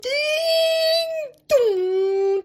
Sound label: ding dong